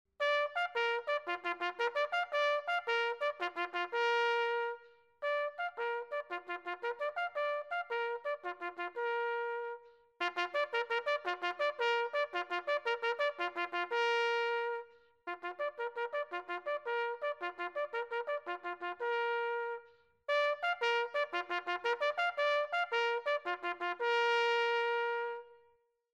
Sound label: reveille; diggerhistory